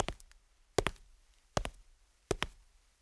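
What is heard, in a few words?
walking on marble; chuze mramor; Mafie 1 cz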